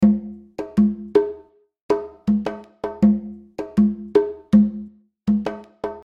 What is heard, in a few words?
Perc Real Tile